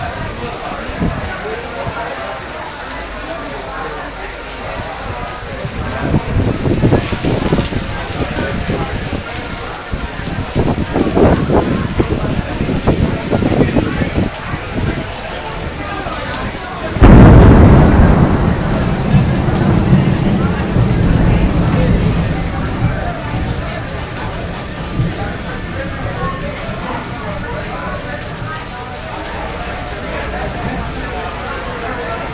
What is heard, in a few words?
another huge thunder storm